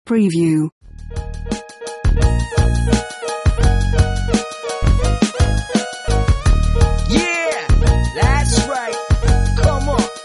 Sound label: hip hop; producer pack